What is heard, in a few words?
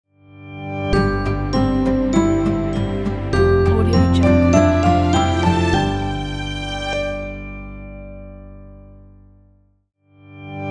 guitar and piano logo